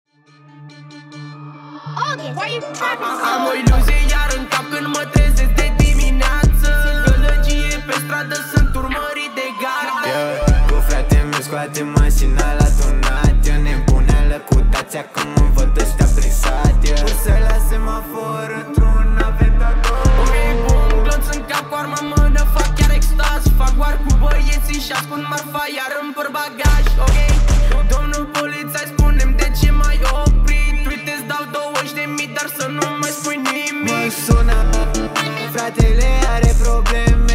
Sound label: loading